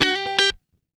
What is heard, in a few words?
Guitar Licks